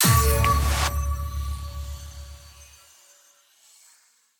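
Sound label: Events Level Ups Power Ups; PMSFX; Forgotten Neons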